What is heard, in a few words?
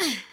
Grunt; Lewd SFX